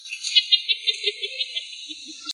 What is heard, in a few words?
hihihihihihihihihihi